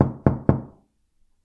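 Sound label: knock on wooden wall; knocking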